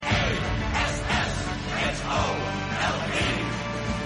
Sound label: crowd, crowd cheering; a s s h o l e